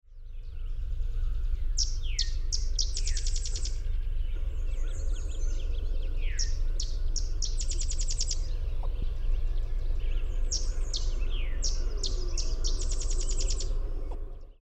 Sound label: Sedge Wren